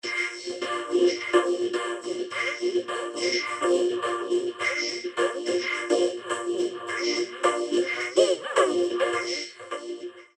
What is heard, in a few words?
vocoder nature choir drums; els vocoder eiosis